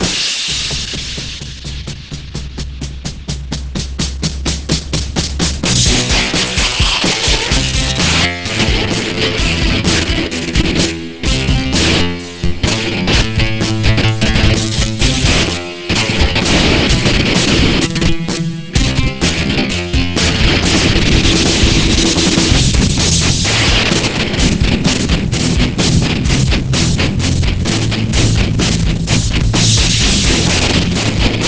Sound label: generic rock; opus